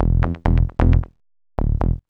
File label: B LOOP